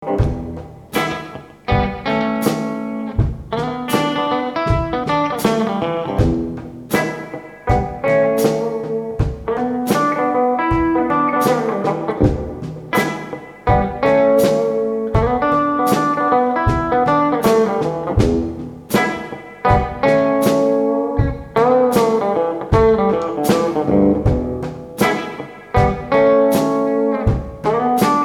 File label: Jammin Funky